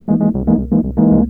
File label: Rhodes, Keyboards; Total Drum&Bass